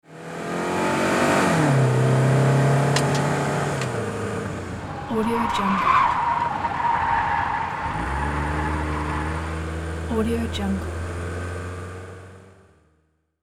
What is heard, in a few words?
car race squeal accent